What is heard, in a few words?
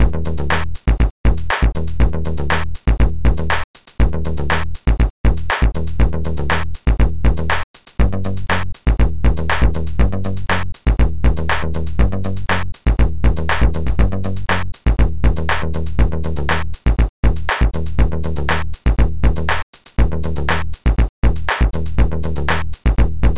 drum; Multimedia Toolkit